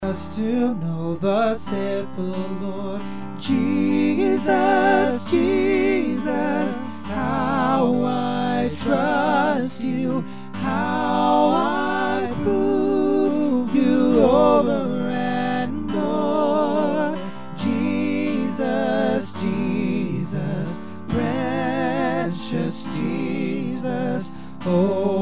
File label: Left Tenor; Tis so sweet